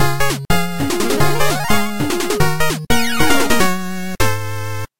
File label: Jingle Minigame Start; Dandy Dungeon Legend of Brave Yamada